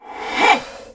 summoner attack; propack

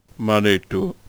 axe thrower die; propack

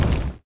archmage hit; propack

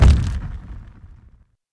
technician hit; propack

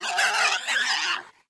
pig die; propack

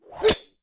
swordman attack; propack

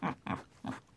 pig ack; propack

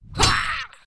daemon attack; propack

